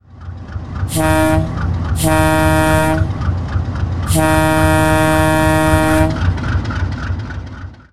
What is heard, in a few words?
Wabco E2; Wabco E2 ULT